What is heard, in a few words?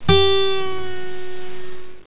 bend, guitar